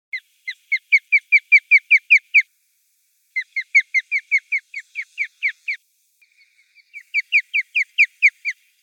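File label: skopa, osprey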